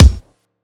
large room bass drum single hit d key, bass drum sound tuned to the D note; kick